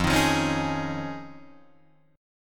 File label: F Minor 7th Sharp 5th Guitar Drop D, chord